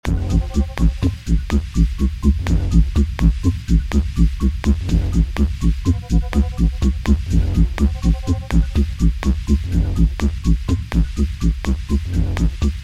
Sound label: space loops; dissonant protean; space loops lite